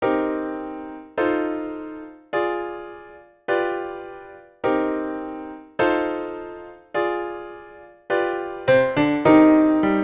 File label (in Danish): Alle stemmer; Advent